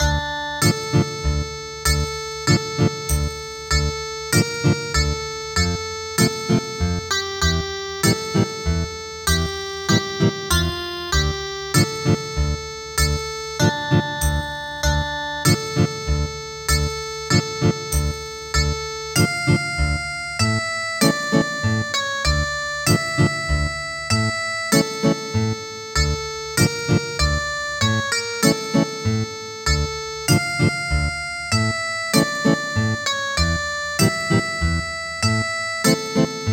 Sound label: Ethnic Guitar